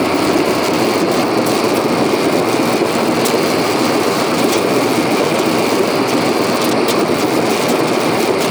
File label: Veil Tube Loop; Warframe; Veilbreaker; Kahl Quest